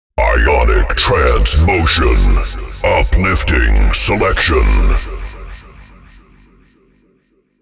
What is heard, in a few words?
trance motion uplifting; Clubs